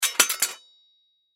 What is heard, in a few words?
Sword Drop Single On Ground Cement Cutlass Pirate; Warfare; Blastwave